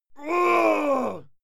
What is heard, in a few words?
male fight scream pain; soundpool